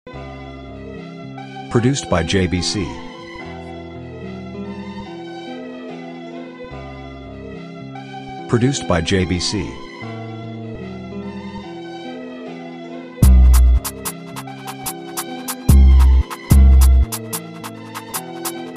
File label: Instrumental